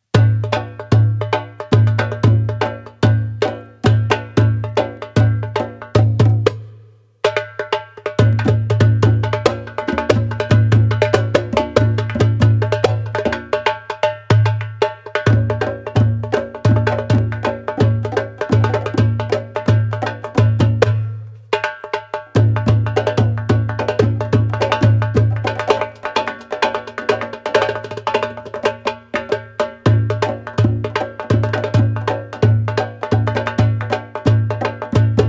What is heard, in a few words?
drums solo